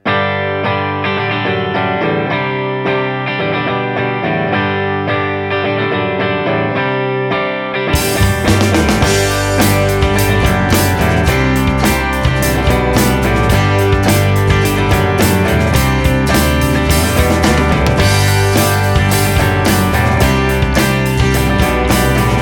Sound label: RockPop